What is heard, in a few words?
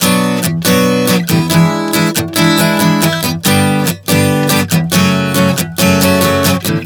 Bm-E7-G-F#m 140 bpm, acoustic guitar chord progressions